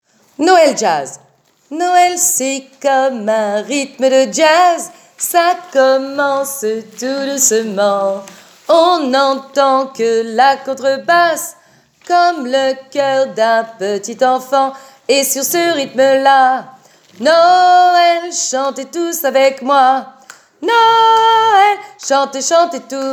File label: noel jazz